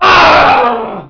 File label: ahhhh; Green Units; 1997 MMX LAN PACK (win 95); Army Men